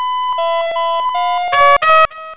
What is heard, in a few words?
brain shock